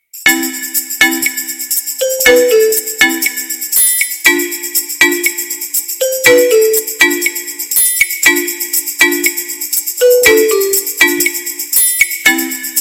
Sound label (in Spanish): flauta